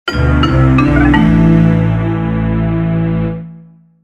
Fail

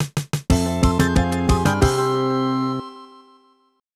A Drawn Game; Super Mahjong 3; SNES

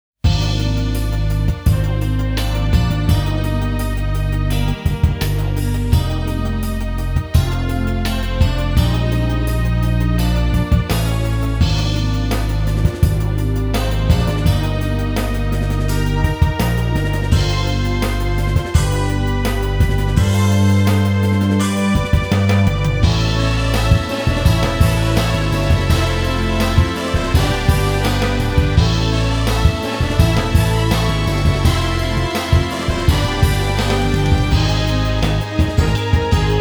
Ending BGM; FC SEGA Ending BGM

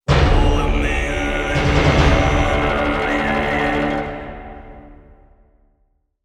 Lose

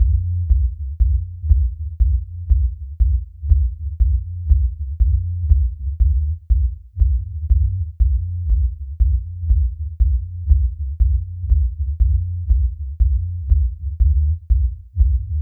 Lobby (Subwoofer); Inkopolis Square; Splatfest

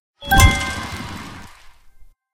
Trophy Receive Bronze

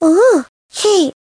sheep

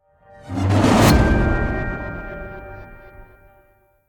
stinger hero appear; roguebook

